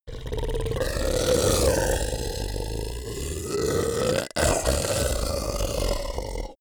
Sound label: beast grunt warning angry; kreaturen; comedy-cartoon; soundpool